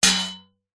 warfare sword strike metal could be shield vibration; zapsplat